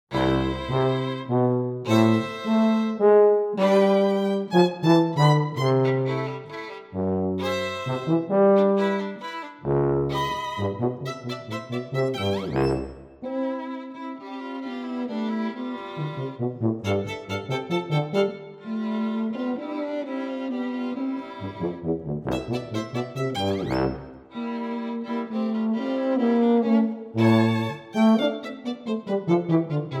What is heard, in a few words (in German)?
Unterkagner Ländler für Violine und Tuba, Come prima-presto